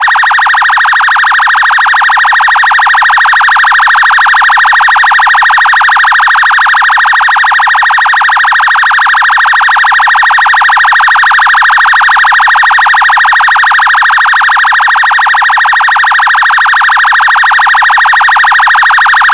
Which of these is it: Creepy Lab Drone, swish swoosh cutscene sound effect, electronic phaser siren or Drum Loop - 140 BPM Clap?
electronic phaser siren